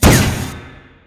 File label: attack; skbm clown bat; ClownbatSKBM